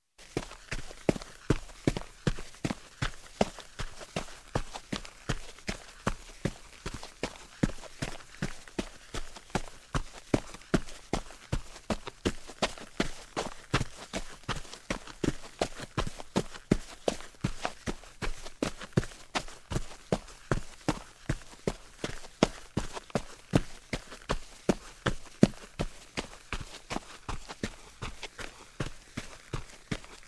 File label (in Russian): Probezhka